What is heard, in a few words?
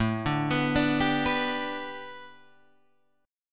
A 7th Suspended 2nd Suspended 4th Guitar Open G; 7,7,9,7,8,9